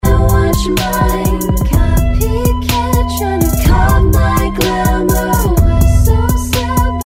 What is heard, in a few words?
B Vox